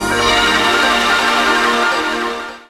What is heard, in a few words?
Riffs, Arps & Hits; Jungle; Blu Mar Ten - Jungle Jungle - 1989 to 1999 Samplepack